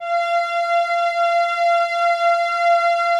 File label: PAD F6